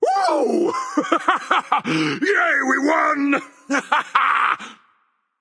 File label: "yay"; smite; voicepack; poseidon